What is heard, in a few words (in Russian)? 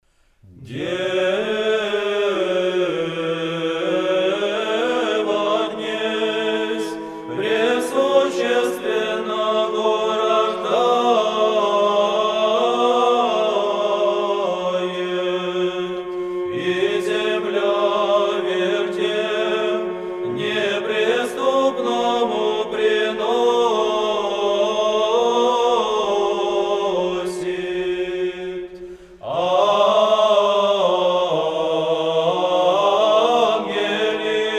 kondak rozhdestva hristova glas 3 grecheskiy raspev; Верою и любовию